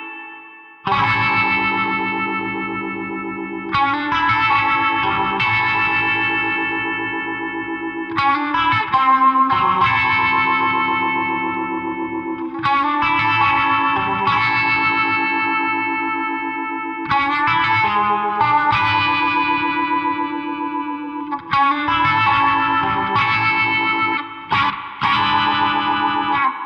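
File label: Blues Guitar; Digidesign; Pro Tools Essential Loops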